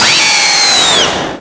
Grito de Zebstrika

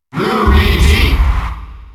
Grito del público; Luigi; SSBM

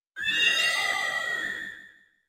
Grito de Hatterene

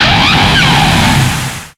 Grito de Torterra

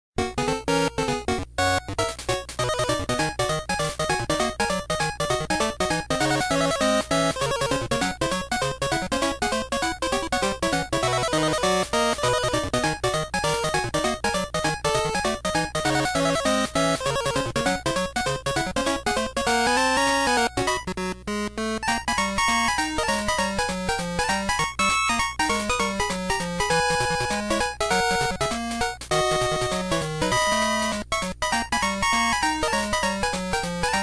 Bonus Meowth Pinball

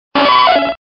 Grito; Nidorina

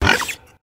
Grito de Lechonk

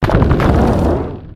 Grito de Boldore

